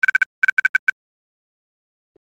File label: Alarmlike Sequence, beeps & tones